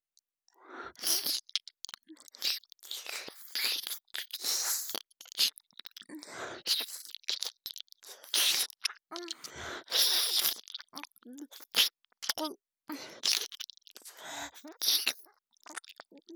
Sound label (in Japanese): 激しく舐め回すような耳舐め後半、ぐっぽり耳穴に舌先を突っ込む